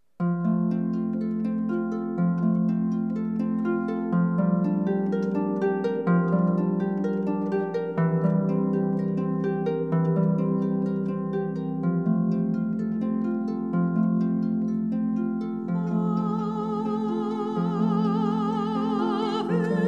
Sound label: harp voice ceremony; Wedding